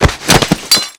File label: Body Falls Heavy Glas